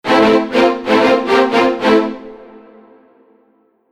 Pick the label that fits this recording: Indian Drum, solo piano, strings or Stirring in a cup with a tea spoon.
strings